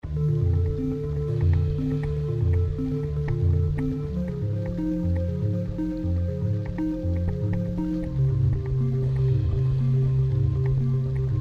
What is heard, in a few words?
Upbeat alt. rock (with silly singing robots; Alternate; Splatoon style